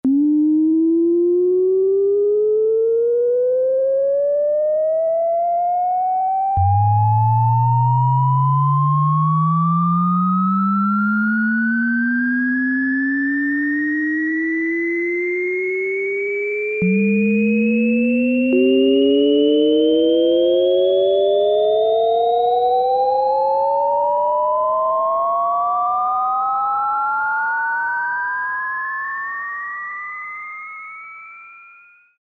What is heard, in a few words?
Going Up, Roland Juno-106; Factory Preset